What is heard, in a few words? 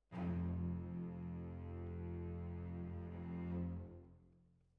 Violins Over